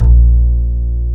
acoustic bass

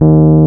RHODES HRD; E-MU Formula 4000 Series Vol. 3; Analog Odyssey; VK Instruments

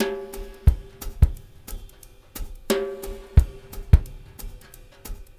SWT DRUMS, SWINGTOMS; Spectrasonics; Liquid Grooves 1 & 2

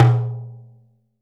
timba, Drums & Perc; AKAI S6000 CD-ROM - Ultimate

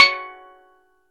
steel drum

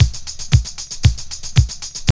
house loop

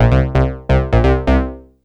BAS, 2 Step; USB Soundscan vol.51; House Side Of 2 Step; AKAI

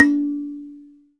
SANSA D 4, KALIMBAS, Heart of Africa

